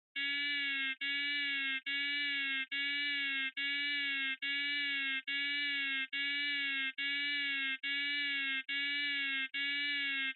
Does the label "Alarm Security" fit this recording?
Yes